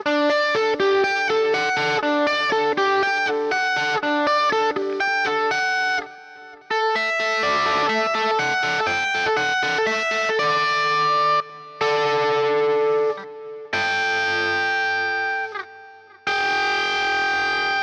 sound test, schrille kreischende toene durch pickup

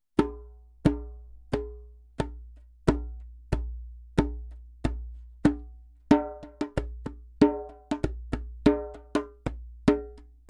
trommeln mxl603

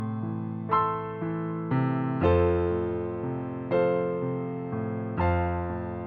klavier

